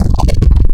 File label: Contact, Growl Tables; Cymatics; Growl Tables Vol 3; Serum